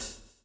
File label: SWNG